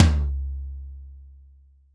big tome drum sample c sharp key, Loudest frequency: 754Hz